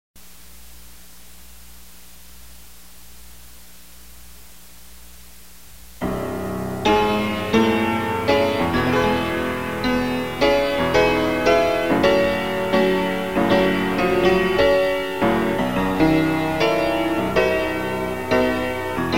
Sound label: PIANO